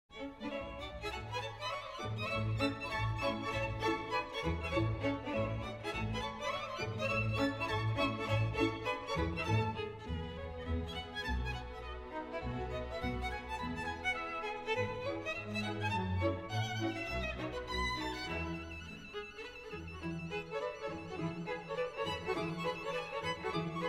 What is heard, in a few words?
four violins